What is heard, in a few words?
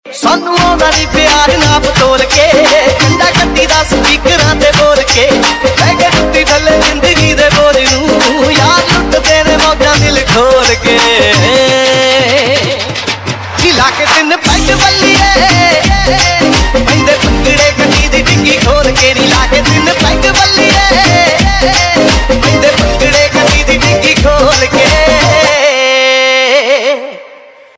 Ringtone, Punjabi